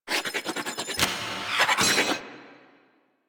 SFX Throw Glaive; Cinematics; Teshin; Warframe; The War Within; Mountain Pass

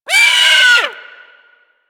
Sea Bird Vocal Death; Warframe; Hunting Critters; Ostron Sea Bird

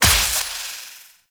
Serpent Ability Tendril Crack; Warframe; Warframes; Alchemist

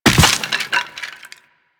Land Soft Bone; Footsteps; Warframe; Operator

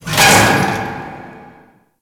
Intermediate Coring Metal Bin Stop C; Warframe; Ambience; Grineer; Grn Intermediate Coring

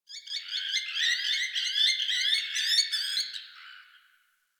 Tree Critters Happy Calm, Ambience; Warframe; Duviri

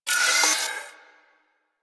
Turret Harness Movement; Warframe; DE; Vehicles; RailJack; RailJackTurretHarness

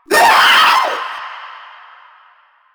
Proto Infested Runner Vocal Short Attack, Enemies; Warframe

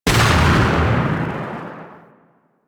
Battery Charged Shot; Warframe; Cowgirl

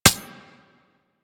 Foot Stab; Enemies; Abilities; Warframe; Corpus; Arachnoid Hunger

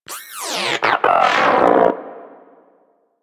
Moa Inquisitive Vocal Death; Warframe

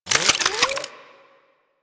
Modular Secondary Handle Slow Reload, Guns

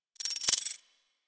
Collar Jingle, Pets; Warframe; Zanuka; Zanuka Companion A

